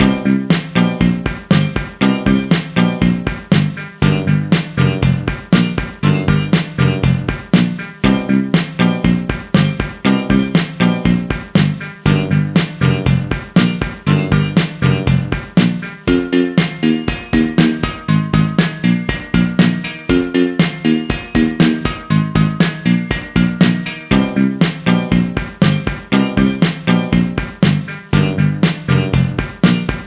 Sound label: Car and Track Selection; cars mater national championship gba gamerip 2007